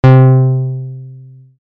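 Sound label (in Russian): арфа низкая нота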